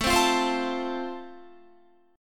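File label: A Minor Sharp 5th, Guitar, chord strum; Am#5 strummed; JGuitar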